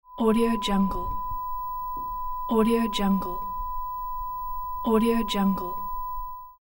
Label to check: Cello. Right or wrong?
Wrong